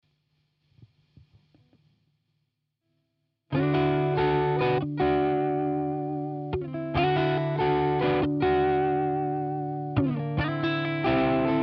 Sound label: D140 GT Riff Trash